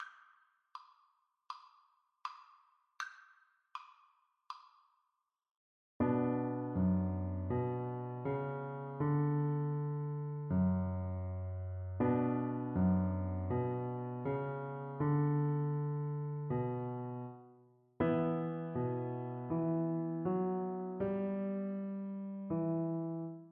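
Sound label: VLC karaoke, Cello; bizet; perl fishers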